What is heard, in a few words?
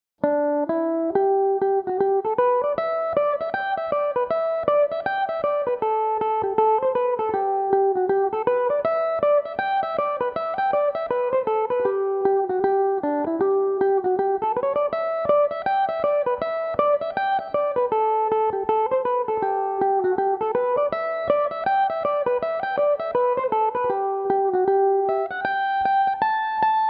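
G major, Irish mandolin; Teresa Halpin's; (4/4); Belmuse EM-190 electric mandolin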